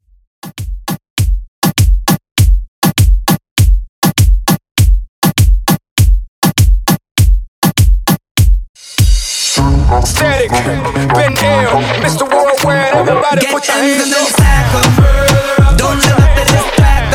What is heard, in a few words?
Urbano 100 Bpm